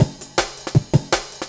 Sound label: 160, Drum&Bass Classics Breaks; EZRollers